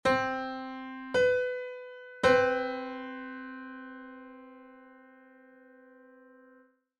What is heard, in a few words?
Piano 15edo